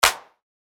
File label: Claps